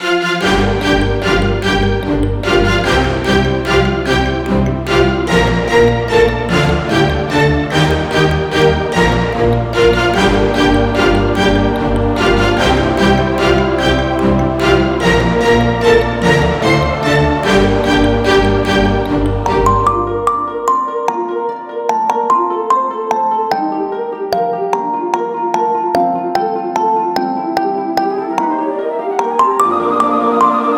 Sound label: chabad orchestal